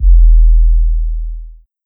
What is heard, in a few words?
Kick